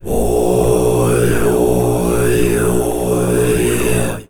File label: Vocal Puja Chant; Djgriffin